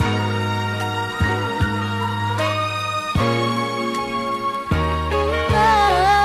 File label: keys; Phonky